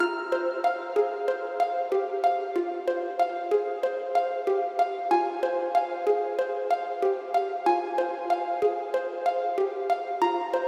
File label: Menu Loop